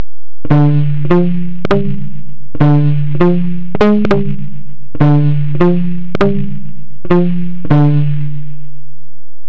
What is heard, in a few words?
Clean, electric guitar